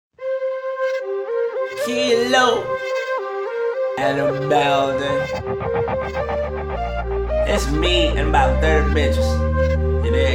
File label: freestyle